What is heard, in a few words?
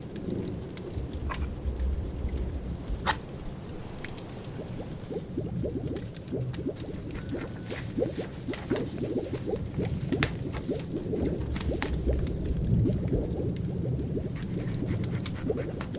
ambient sounds, wizard; Heroes of Holdem